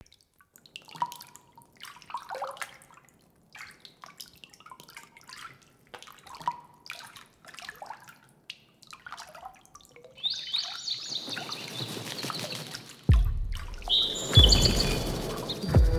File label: Beach Scene; New Player Login Sequence